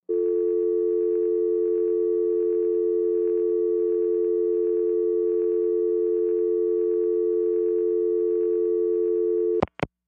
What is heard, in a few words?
Telephone Dial Tone, Telephone dial tone, click at head